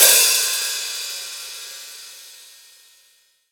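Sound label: ambient hi-hat open, Acoustic kit; Akai MPC2500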